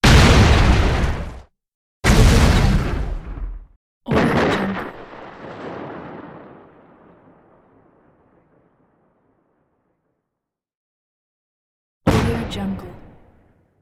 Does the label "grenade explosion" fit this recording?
Yes